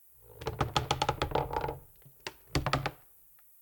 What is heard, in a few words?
Exterior Wood Creak; The Town; 3D Environment